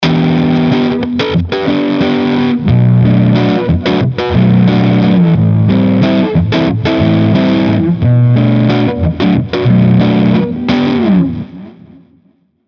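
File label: Mayones Setius Pro Bridge Singlecoil, guitar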